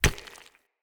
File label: Unarmed Punch Impact Rock; Weapon Impacts; Player; Environment